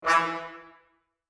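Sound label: single note trombone mute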